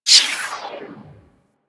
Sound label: Skate Stride; Warframe; Corpus Modular Crewman; Skate Movement